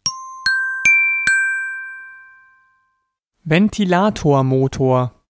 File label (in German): Ventilatormotor